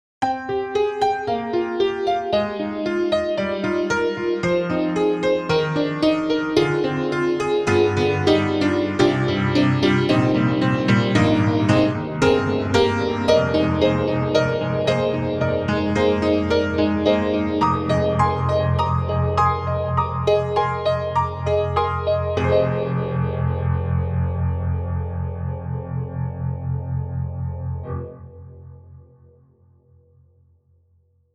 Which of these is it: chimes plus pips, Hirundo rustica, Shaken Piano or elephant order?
Shaken Piano